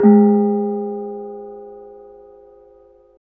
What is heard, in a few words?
KENONG; Spectrasonics; Heart of Asia; KENONG PH